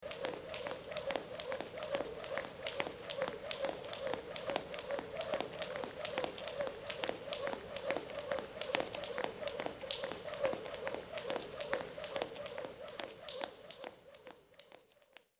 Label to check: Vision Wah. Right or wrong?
Wrong